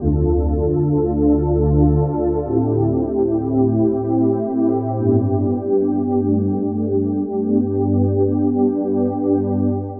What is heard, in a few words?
Ocean Pad; Pads; Dr. Fink's Funk Factory